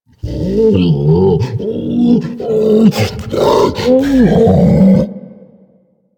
Juggernaut Pre Death Growl; Warframe; Infested; Truffle Hunt

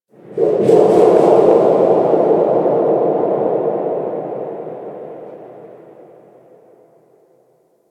Hub Metal One Off; Steel Meridian; Warframe; Tenno

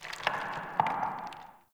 Cave Rock; Glades Of Andurin

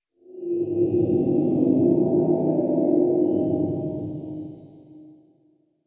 Exterior Distant Calls Angry, Ambience; Warframe; Duviri

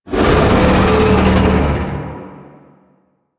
Void Dragon Vocal Pain; Enemies; Vocals; Warframe; Duviri

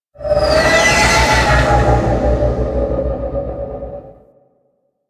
Liset Fly By Quick, Vehicles; Nora; Flight

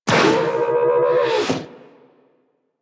Research Facil Repair Robot Rotate; Warframe; Ambience; Venus Open World; Objective Rooms; Props One Offs

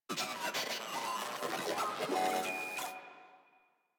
Moa vocal idle, enemies; Warframe

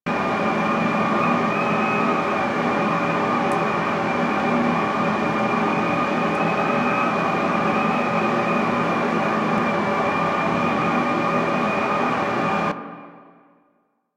Air Vent Loop; Ambience; Props; Warframe; NineteenNinetyNine

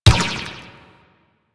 Ball Bounce Rock; Missions; Tenno Ball

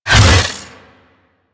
Hammer Shield Whoosh D; Crp Hammer Shield

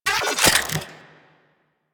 Rifle Reload; Weapons; Warframe; Corpus; Crp Ruban Rifle

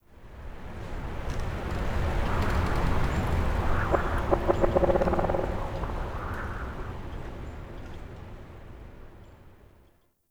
Skylight Large Wind Gust, Root Cave; Ambience; Props; Neathuns Root Cave

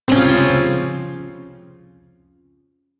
Shawzin Phrygian Chord D; DE; Warframe; Duviri Shawzin

